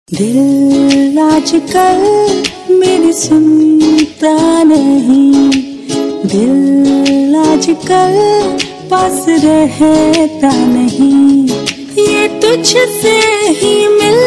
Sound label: Unplugged Female; Valentine Day